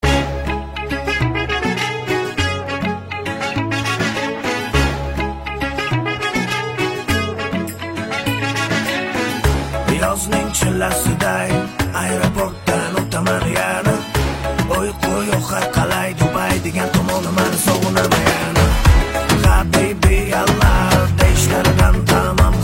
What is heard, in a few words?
rumba